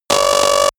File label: 80s computer signal